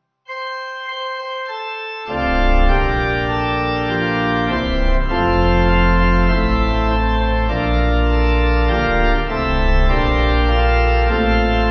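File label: Pipe Organ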